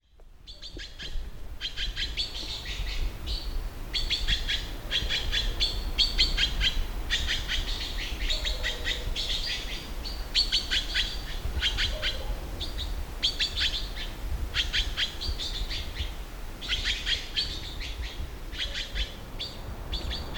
台東知本 台灣藍鵲

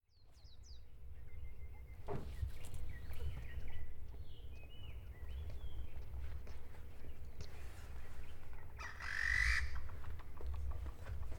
陽明山夢幻湖

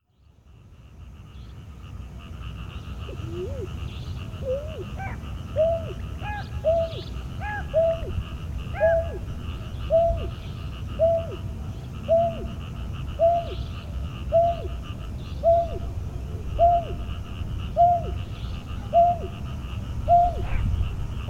彩鷸; 彰化二林

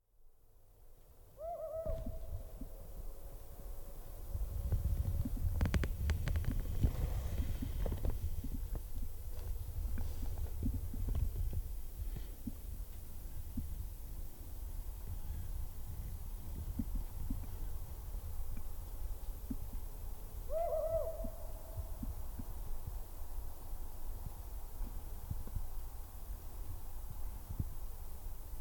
南投梅峰

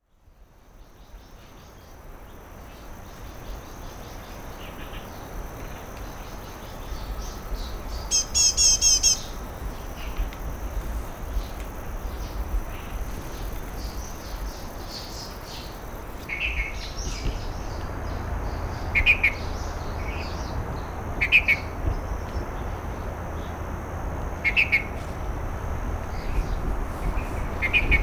知本烏頭線; 洪貫捷; 東沙島

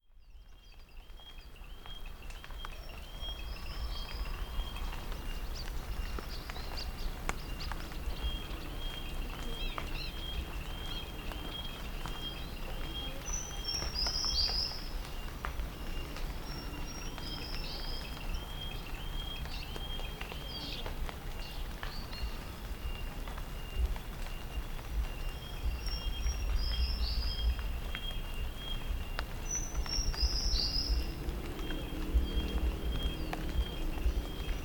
阿里山 叢樹鶯

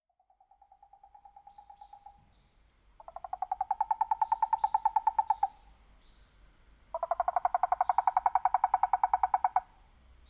南投蓮華池

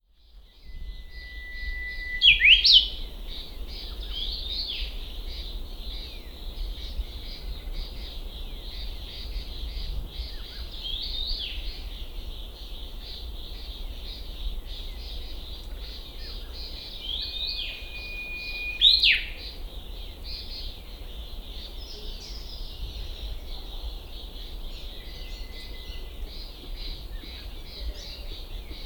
觀霧

